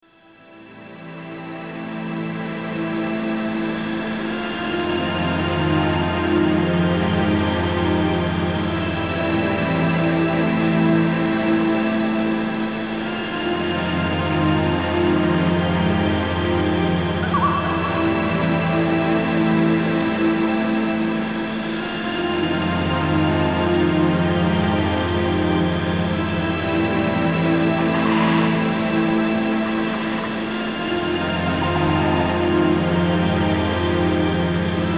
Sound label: Strings Outro